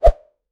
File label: wind